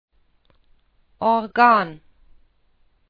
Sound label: Organ